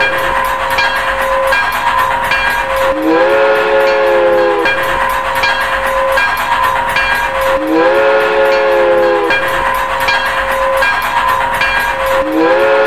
Train; Ahead

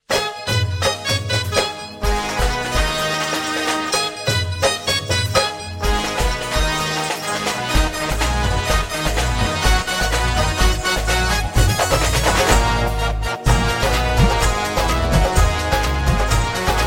Barat Music

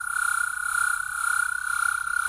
Sonic Screwdriver

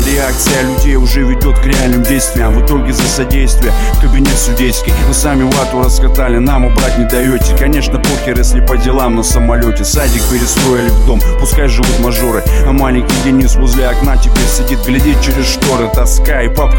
Rap

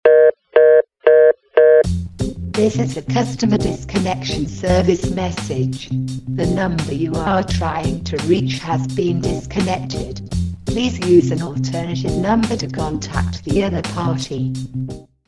Service Disconnected

